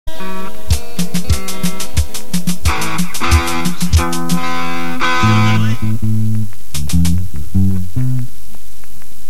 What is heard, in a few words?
instrumental; rock